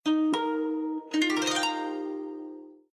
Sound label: Calligraphy Logon Sound, windows 7